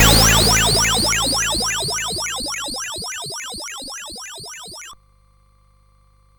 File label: Pulse, CSG8580R5